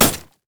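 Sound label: siegerider basic attack; minions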